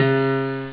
dulled, Piano

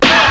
slapz, stabs

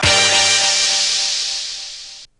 splashy, stabs

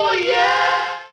"oh yeah" SUNG

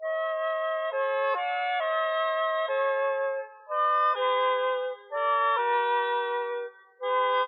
flute, Jazzmatazz Loops

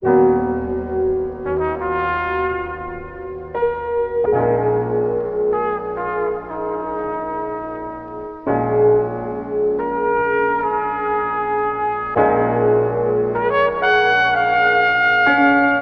horns, Loops, Sad

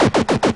four taps; Zaps and Beeps

